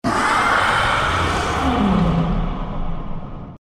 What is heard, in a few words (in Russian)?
krik pryguna; dying light